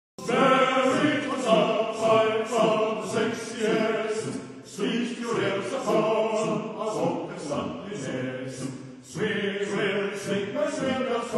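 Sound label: manly men baritone patriotism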